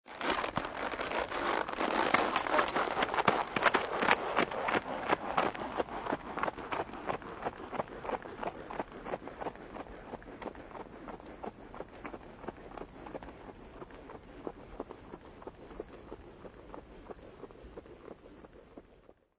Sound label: ANIMAL HORSE TROT